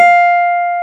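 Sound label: clavinet F3; AKAI S-Series CD-ROM Sound Library VOL-8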